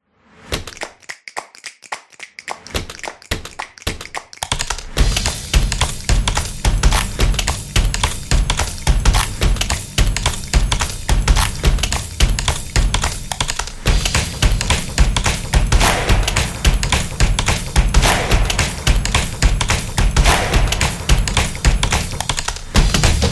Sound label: This Is Percussion Opener